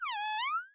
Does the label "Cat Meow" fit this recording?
Yes